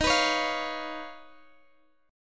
Eb 7th Suspended 2nd Sharp 5th Eb, Guitar Standard; strummed; chord